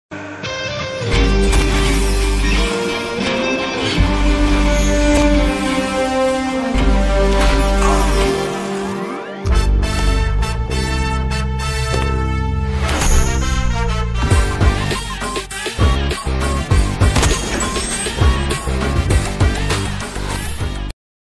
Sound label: bgm ringtones, telugu; pakka commercial